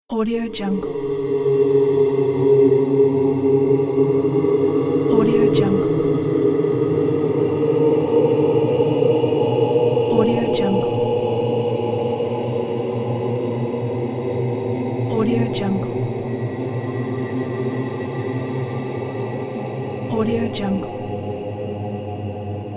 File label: haunted voices